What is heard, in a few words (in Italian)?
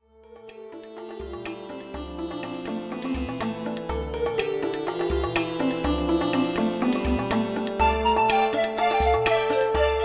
etnico orientale